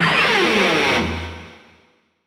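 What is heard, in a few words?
Guitar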